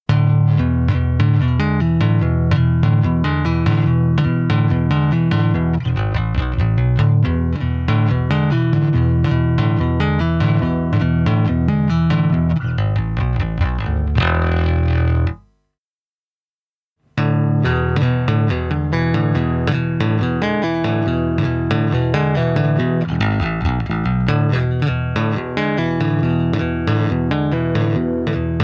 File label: jbf-1000 taping; Modern Jazz Bass